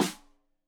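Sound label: Snare; Deeply Sampled Drums; Stereo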